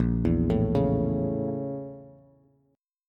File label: Bb Minor Major 7th Flat 5th, Bass; BbmM7b5; arpeggiated; JGuitar